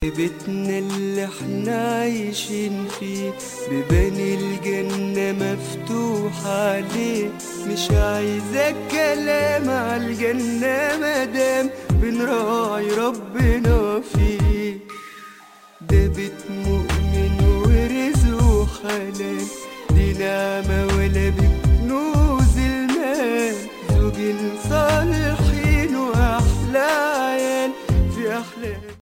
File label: ringtone